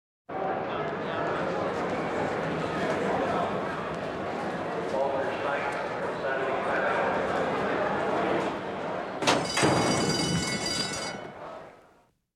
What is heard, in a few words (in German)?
sport - pferderennen menschenmenge am start